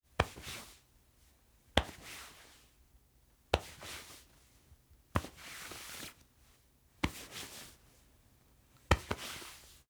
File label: Cotton Clothes Grab Cloth Rustle and Pat; Foley; Foley Essentials; Foley - Misc